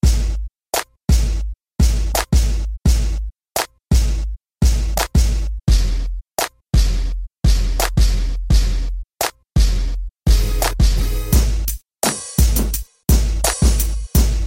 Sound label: Beat Step